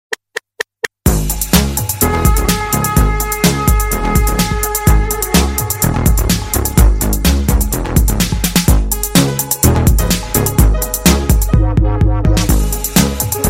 nokia dubstep ringtone

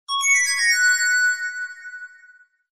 huawei nova 2 sms ringtone

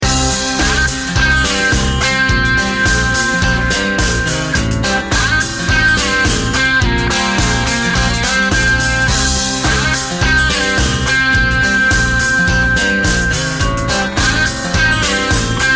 classic melody ringtone

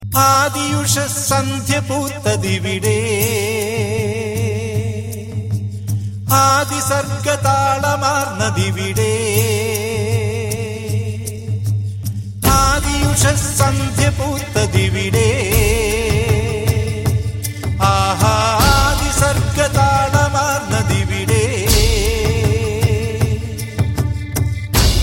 malayalam crazy tone ringtone

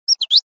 bird notification ringtone

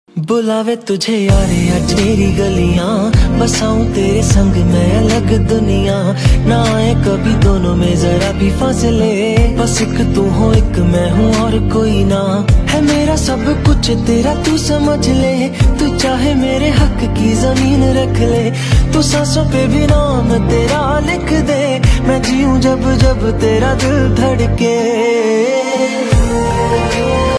bollywood ringtones

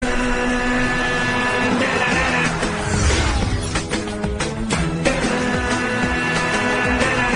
srilankan ringtone

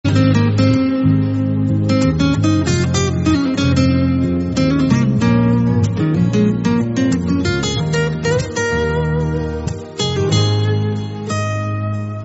apple romantic guitar ringtone

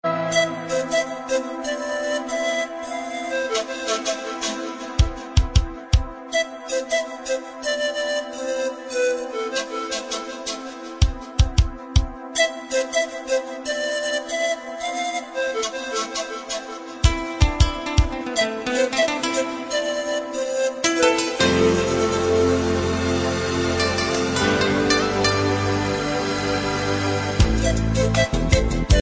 pan flute ringtone

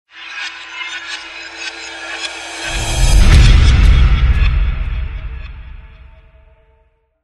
samsung galaxy a20e sms ringtone

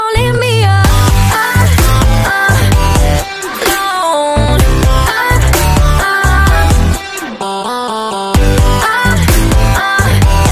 hot electro ringtone

